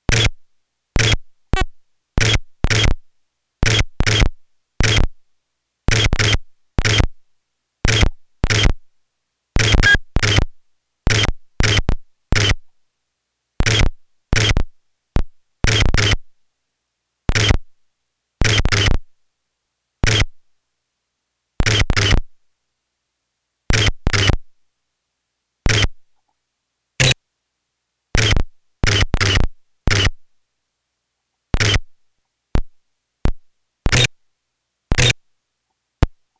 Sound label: Water Drop